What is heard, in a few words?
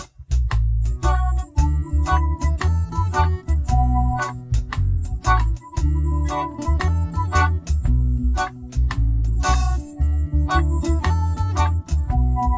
music